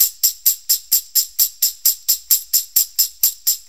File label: Tamb, Perc Loops; Drum Tools